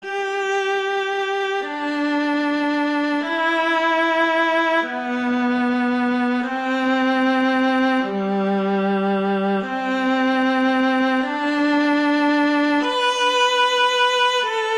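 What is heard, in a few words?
Pachelbel Canon in D, Very Easy Collection viola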